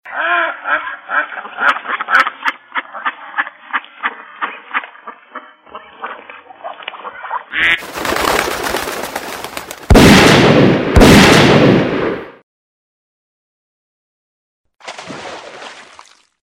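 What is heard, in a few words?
ducks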